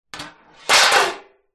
household metal baking tray down on concrete; zapsplat

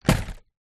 foley card advent calender down on surface

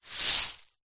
small stones scoop up by hand; foley; zapsplat

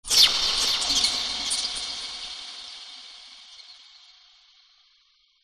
sweep harsh bright jump scare

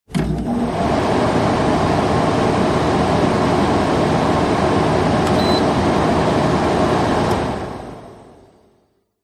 household heater built in on run off short

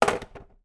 industrial leaf blower down on hard floor

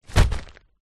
tent in bag drop wood; foley; zapsplat

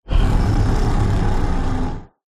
horror dragon breath fire with growl

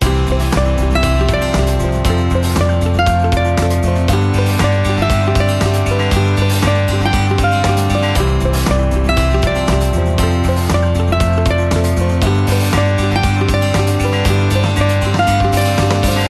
music Happy; orlamusic

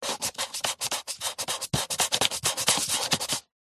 office pencil artist markings smudge with finger

